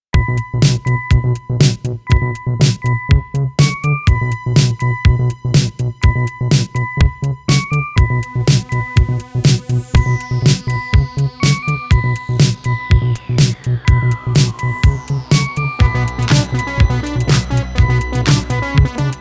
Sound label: Synth Dance Party